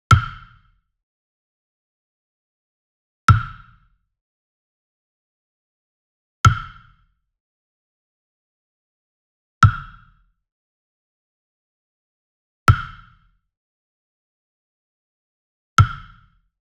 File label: Wood Percussion Wood Block Standard Resonant Hit Near; boom library; Cinematic Strikes Construction Kit